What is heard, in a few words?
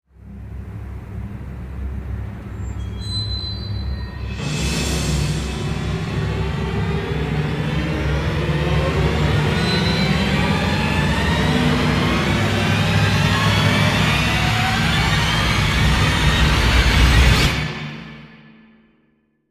String Rush; Gothic Storm Music